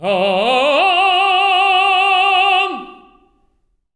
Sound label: TENARP G3, tenor arp, voices